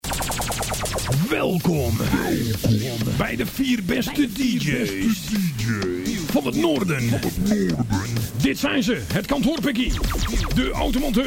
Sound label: Jingle; Audio Fragmenten